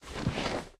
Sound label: bed